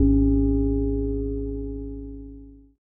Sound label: Moog Gong